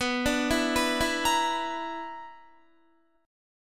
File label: B-Minor Major 7th Double Flat 5th B down Guitar; arpeggiated